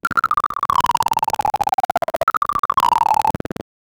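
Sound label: a sudden descending digital, warping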